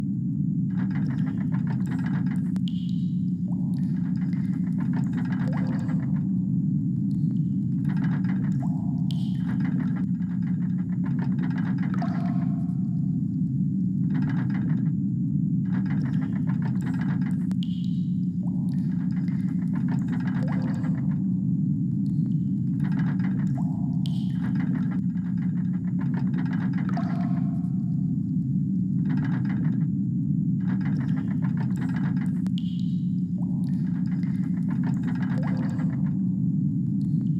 Workshop Ambiance

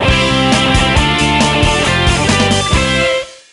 Win tune; Squeeballs Party; nds

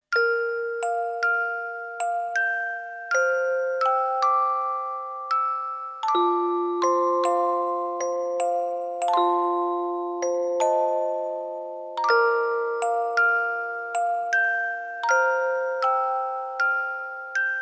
orgel arrange; Kimi ga Nozomu Eien

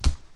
Bare Footstep; Lethal Company; Windows

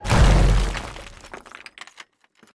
Rock Wall Break

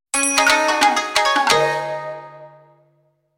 Battle Lose; Battojutsu

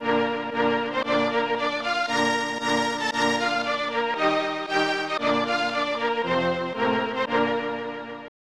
National Anthem of Scotland; Elite Soccer; SNES

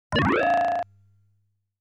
Boss Fires a Bullet

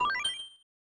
Small Item Jingle

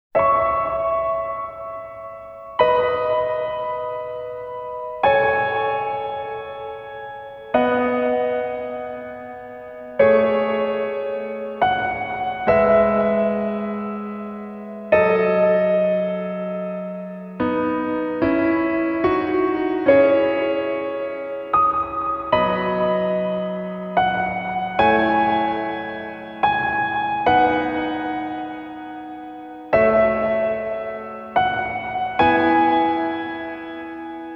Piano version; Shadow Hearts 2

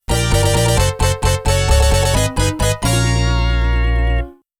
Losing Fanfare; Dream Co., Ltd.

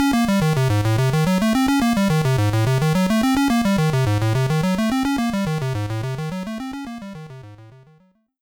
Miss; Game & Watch Gallery; GB; Manhole

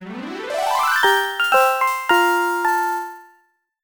Medal Jingle; Bedroom

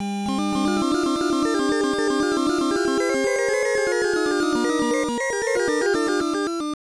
Challenging Stage Clear

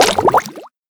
Activate Soap; boom karts multiplayer kart racing android

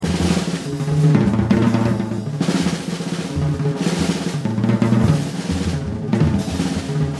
Noticed Drums

